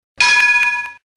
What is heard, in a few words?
Amsterdam Tram Bell Sound; GVB Combino Bel Signal